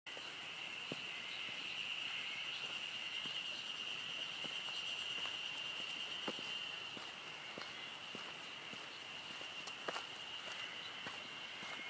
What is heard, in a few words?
Mountain Trail